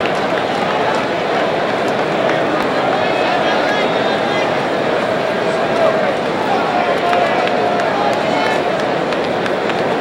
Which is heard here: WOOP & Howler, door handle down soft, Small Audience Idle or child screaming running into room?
Small Audience Idle